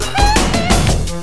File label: heehee; Desktop Themes